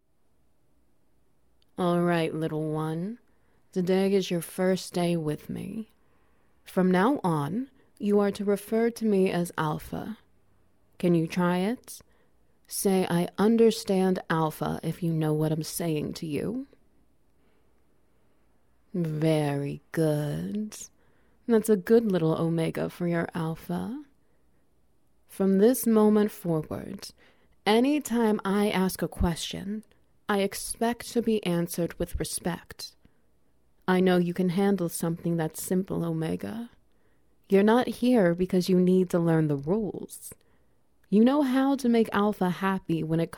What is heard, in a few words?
Wet Noises; Learning to Take a Knot Orally